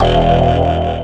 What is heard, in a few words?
deep reso bass; Basses